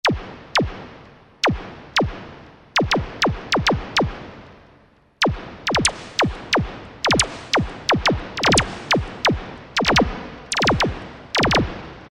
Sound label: COMPU BLEEP; Akai SG01v